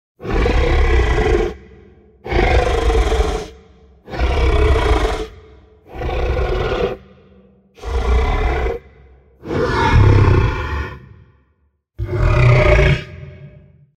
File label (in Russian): dyhanie s rykom dinozavra